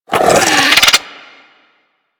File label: Infested Biped Alert, Vocals; Warframe; Moa